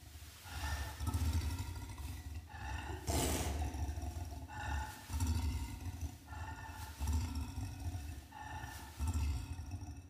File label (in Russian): orangutana